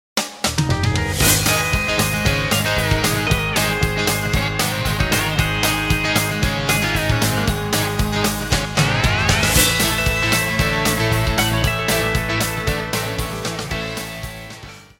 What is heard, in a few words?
jingle